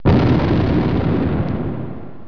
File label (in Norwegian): eksplosjon